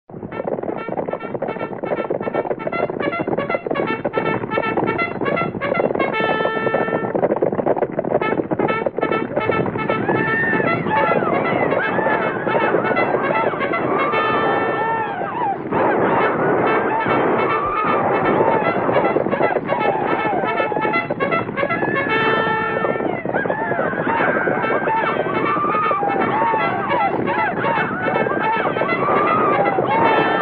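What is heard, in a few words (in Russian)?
звук горна к атаке вестерн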